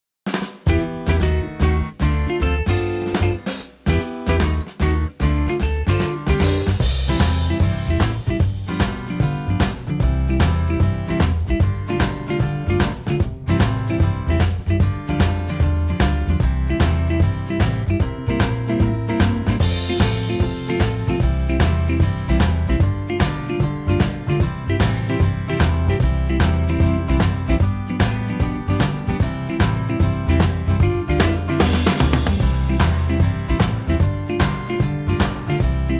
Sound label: C Db no flute; key - C to Db - vocal range - (D) F to A